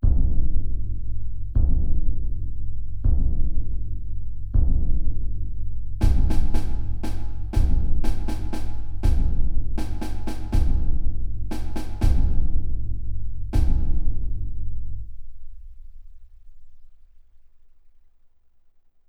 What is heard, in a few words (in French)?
Ternaire 3 2